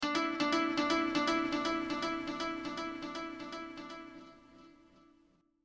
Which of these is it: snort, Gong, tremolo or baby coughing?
Gong